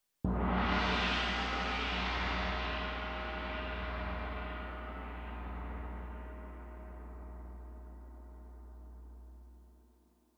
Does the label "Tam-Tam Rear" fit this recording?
Yes